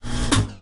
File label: Air Conditioner Switch; lethal company; ambience; windows